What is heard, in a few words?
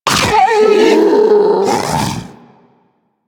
Predator Vocal Death; Warframe; Hunting Critters